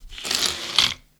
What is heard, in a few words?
coins pickup